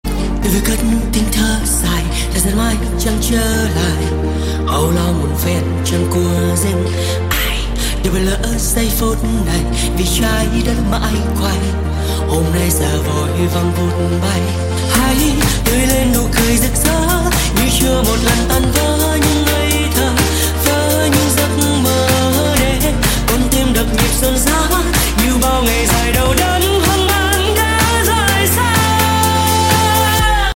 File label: hahaha remix